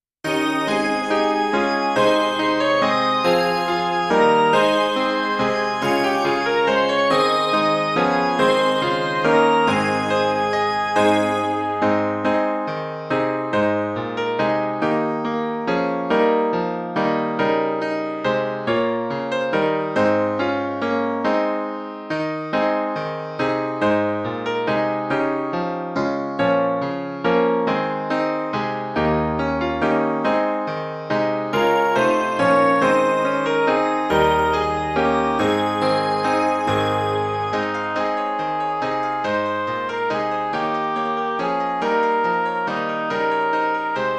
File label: we are so glad midi